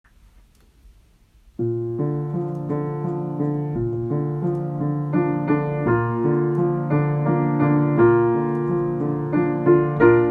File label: Brahms lullaby